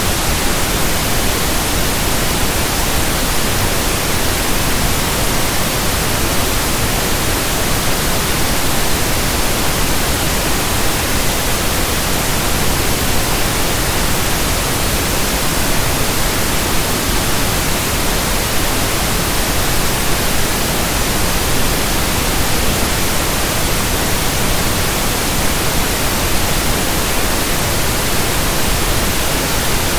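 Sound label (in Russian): розовый шум 44kHz; 16 bit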